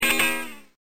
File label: RIFF